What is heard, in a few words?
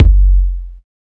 Big Beat Drumloops, SPEED 140